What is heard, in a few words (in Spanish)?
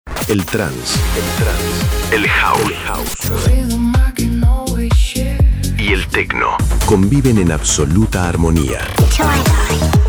Pack Musica Electronica